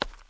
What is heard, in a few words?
stone, steps; VoxelEngine